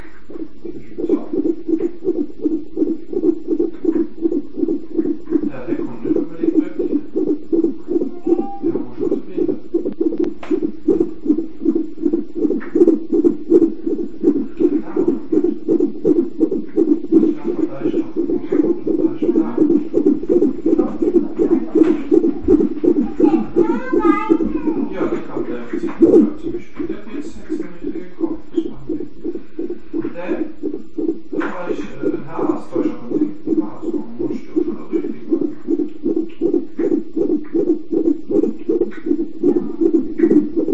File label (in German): Herzschlag